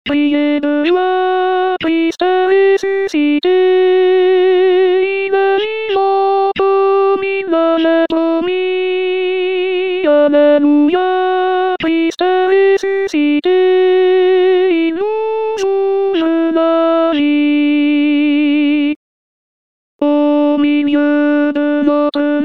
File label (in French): Criez de joie Alto, Chorale